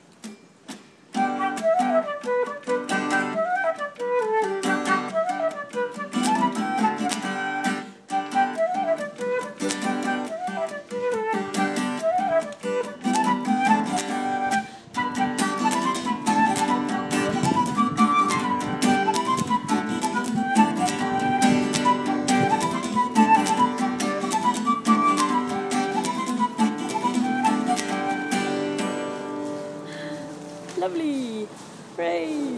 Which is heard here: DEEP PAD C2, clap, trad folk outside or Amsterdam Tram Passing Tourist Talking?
trad folk outside